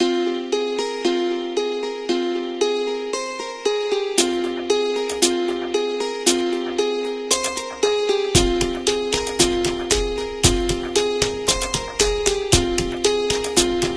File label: eastern winds